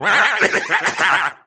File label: water cough; smm wario